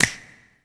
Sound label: SNIP; drum; CLAPS+SNIPS